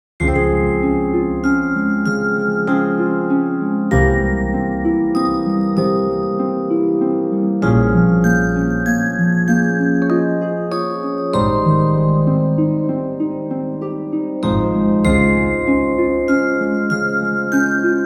setunai gogono umi, bgm

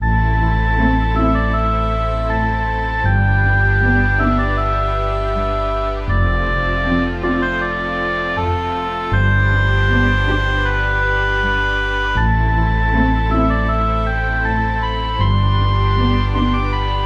yamaokuno mura, bgm

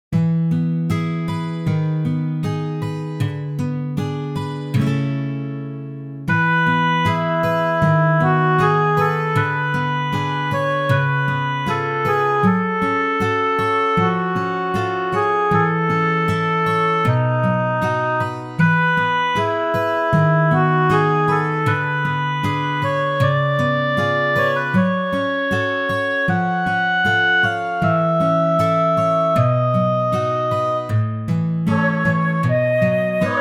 kokoroatatamaru mura

